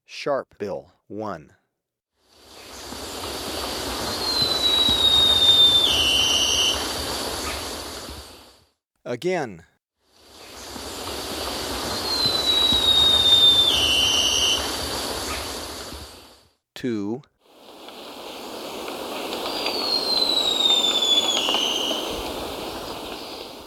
Sharpbill, Birds of Ecuador; S. America; Natures Songs

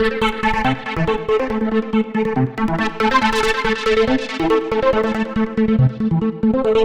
Synth Soul

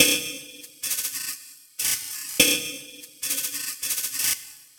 Ductile Shaker

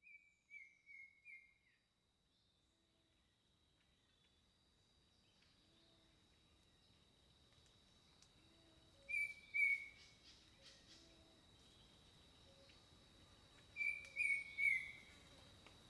Jungle Birdsong; Jungle Talk; Echoes of Nature; The Natural Sounds of the Wilderness

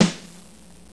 SNARE

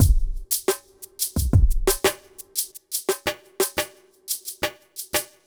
bad mood beat 88

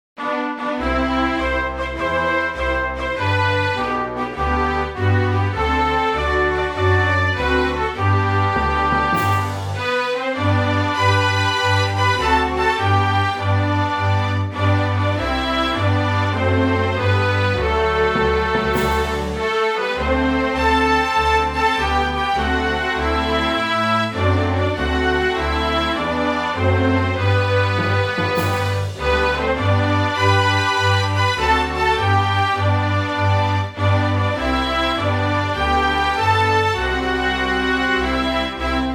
hym indonesia, National Anthems

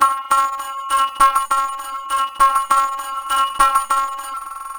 Plucked Cowbell; Metal

